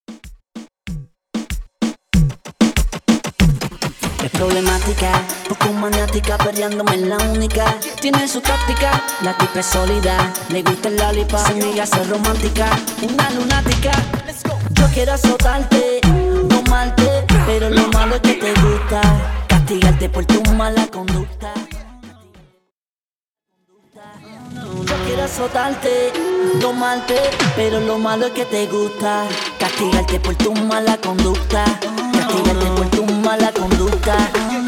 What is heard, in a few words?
Intro 95BPM